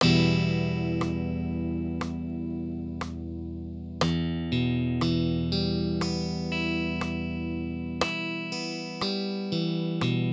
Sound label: Em rhythm